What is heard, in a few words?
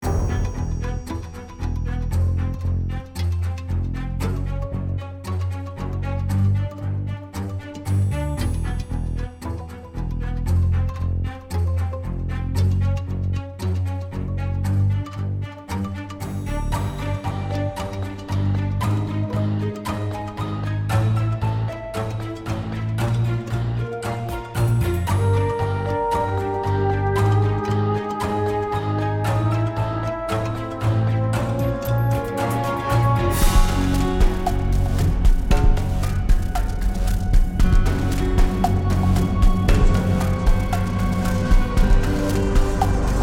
Explore 115BPM; Disney Infinity 2.0 Marvel Super Heroes